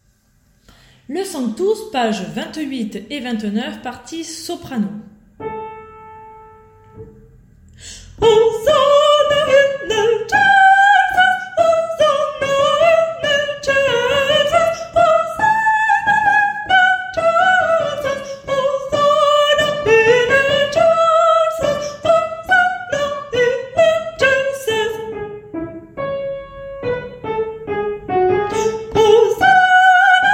soprano; Sanctus p28

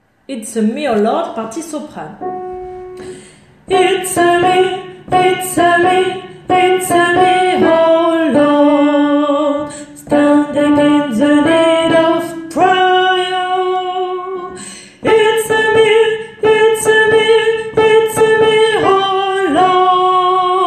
Soprano

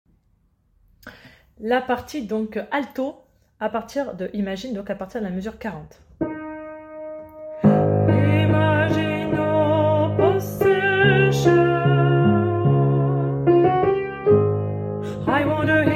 Alto

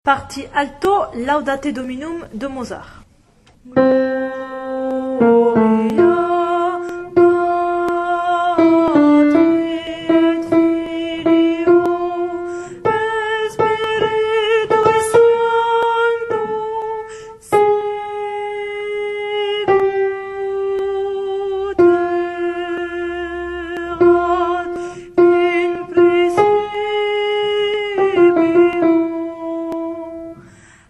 Laudate Dominum Alto; Mozart

